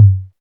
natural tom drum one shot G key, Royality free tom sample tuned to the G note; Loudest frequency: 103Hz; tuned drums g key